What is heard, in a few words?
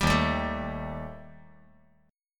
Eb Major Flat 5th Eb